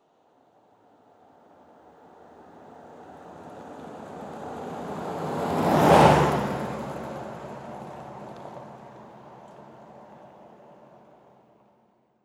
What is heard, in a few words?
jeep cherokee passing